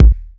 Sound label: dry kick single shot A key; kick drum samples